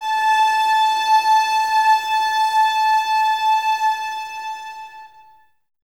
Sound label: String Ensembles, Cellos, No Vib; Miroslav Vitous